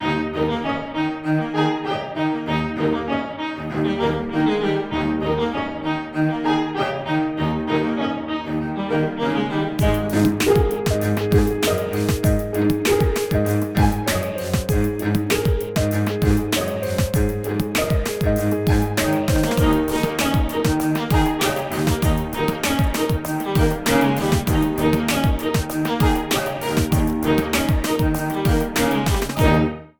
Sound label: Grooved Strings